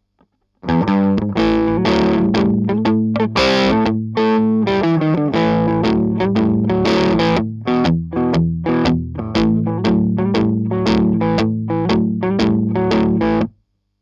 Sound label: germanium drive smooth highs full boost; Chandler Limited